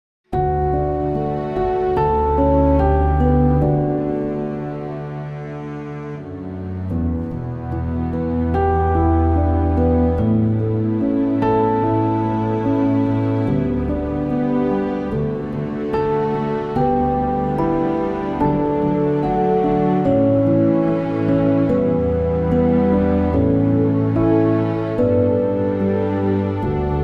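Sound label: D orchestra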